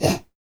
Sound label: FEM PERC, Vocal Jazz FX